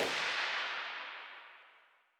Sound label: kedr loop far tail; Escape from Tarkov